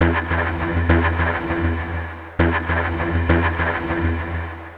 Ugly, Industrial, Nasty

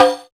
Rek Rim; Roland BK-5; Percussion

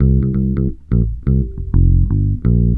TOUC 86 CM7, Bass Grooves; USB Soundscan vol.30; AKAI

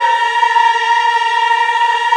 A#4, Choir Acoustic & Synth; USB Soundscan vol.28; AKAI

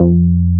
bass Croma F 2; Best Service Gigapack 2

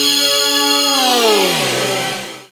suspense, special FX twisted; USB Soundscan; AKAI

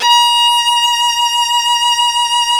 Synclavier Strings; ILIO

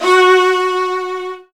Virtuoso Strings

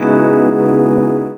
Chords Tones; Equipped Music; Loungin House

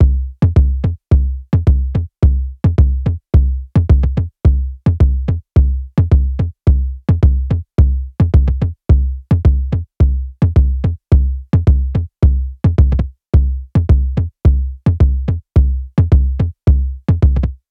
loop bass 108; flora; Seismic Loops; Bonus Bass; SEISMIC2

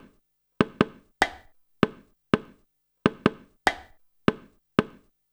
percussion loop

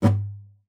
GUITAR FX; Xsample VOL-2

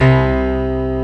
PIANO, HOUSE

